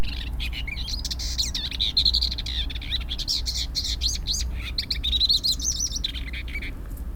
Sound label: warbler paddyfield Acrocephalus agricola